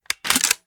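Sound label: reload empty fast mag out, shotgun; gmod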